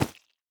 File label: add candle, cake; minecraft; block